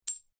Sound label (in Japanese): コインのぶつかり合う音